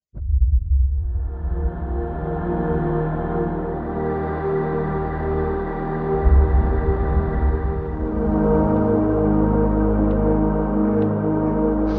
Ambiance; From Dusk Till Dawn

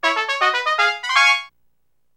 Puzzle Solve; Super Mario Galaxy 2; Wii

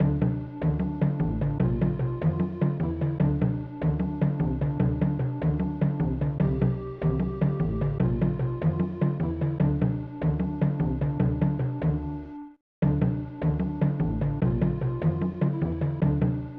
Jungle Rhythm; Pitfall The Mayan Adventure; SNES

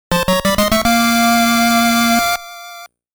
Get Important Item Fanfare; legend of zelda the link's awakening

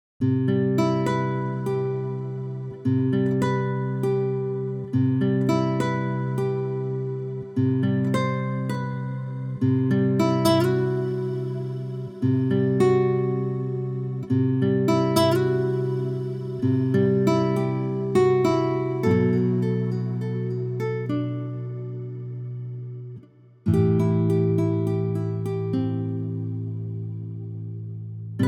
Guitar; Yuna's Guitar

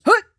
flyer attack

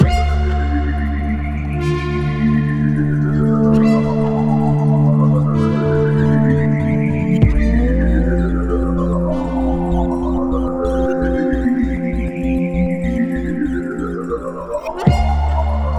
Alert Loop; Nakatomi Building Area

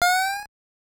Coin Enters; nes; Mario Bros.

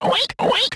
Pig Oink

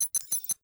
Hack Text Type

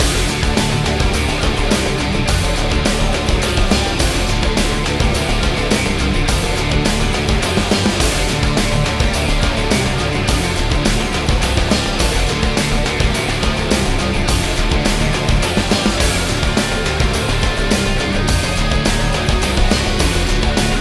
BGM Kowloon Park; Wanted: Dead; PS4; Xbox Series X/S

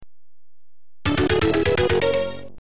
level 3 - completed, soundtrack; level 5 - completed

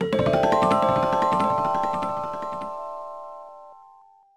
BGM Cinema In; build a bear workshop welcome to hugsville; nds

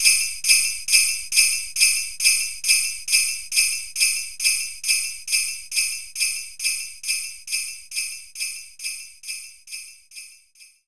Jingle Bell

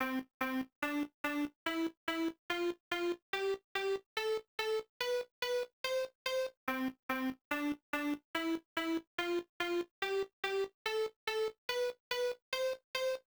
Stereo Check; martial champion arcade

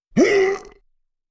Voices Zombie Hit Groans

